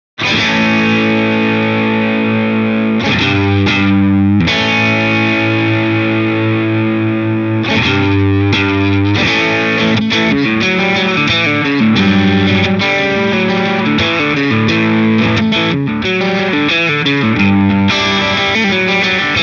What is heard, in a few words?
rig gain wet; guitar